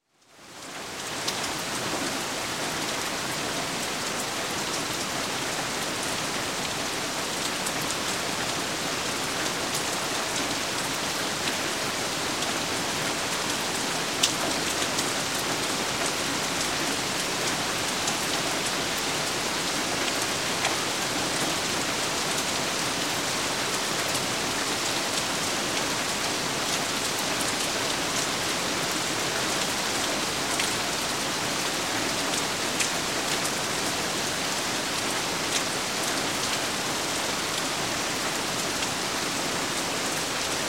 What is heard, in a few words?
Rain, Heavy On Lake, Splash On Dock; Sound Ideas 2000 Series